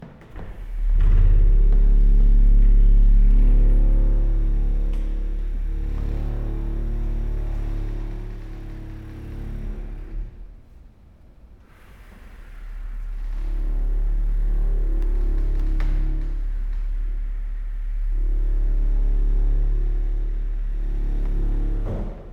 Gallery Table Bass

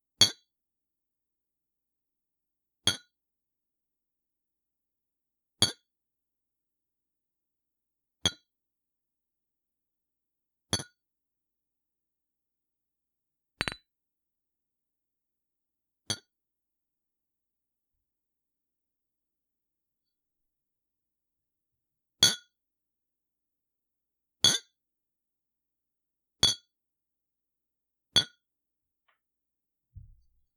Pint Glasses Full Clinking

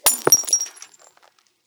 Breaking Glass Jar On Cement

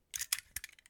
Metal Flick Spring

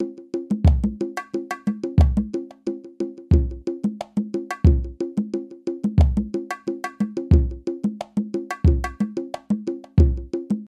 Bass Bongo Riddim; 90bps

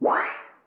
Sheet Metal

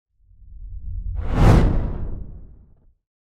Cinematic Woosh

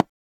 Pen

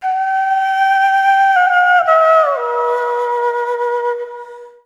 Andean Flute

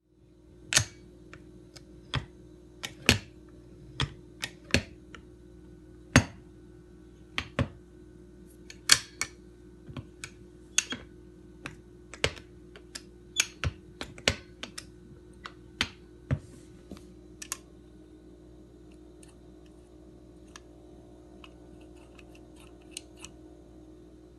Metal Machine Clicking

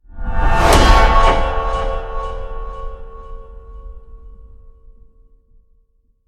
Trailer Punch; Holy Hour

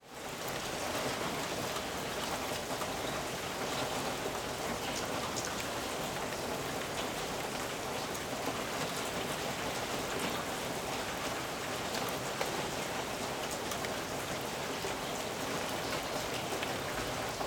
Rain On Roofs

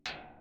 Metal Ping

